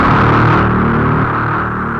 monodelay D#m 129, Recorded with monotron delay and monotron - analogue ribbon synthesizer; instruments; loops